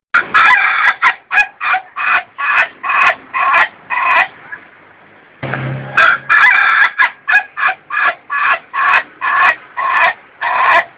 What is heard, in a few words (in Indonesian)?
jenis bunyi ayam ketawa suara slow